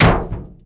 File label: car Kick